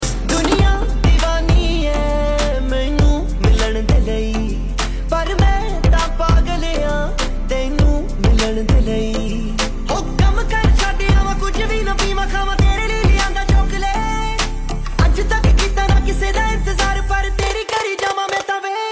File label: Ringtone